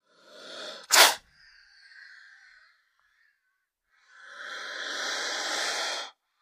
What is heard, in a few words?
Cobra, Indian Hisses And Breaths. Close Perspective.; snakes